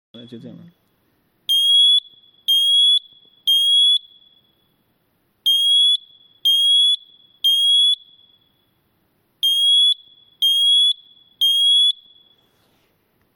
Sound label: Alarm Sound; Sensys-2